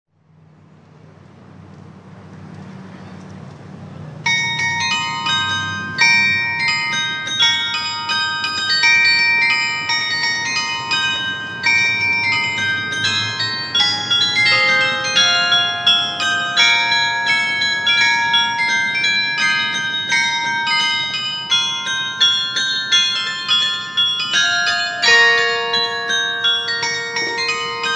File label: carillon; Motten